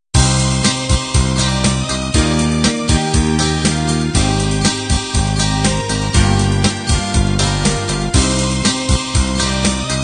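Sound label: pop 16 beat end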